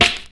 original steel snare drum f key, snare tuned to the F note; snare drum samples